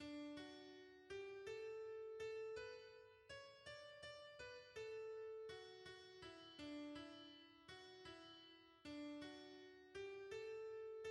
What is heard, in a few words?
soprano, hymns; Louange a Toi Dieu notre Pere